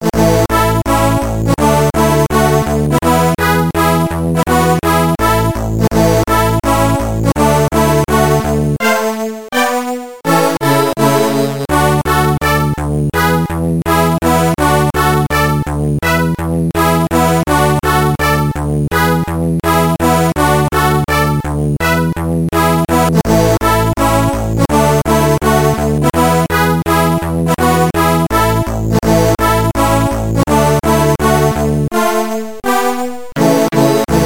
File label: Theme Tune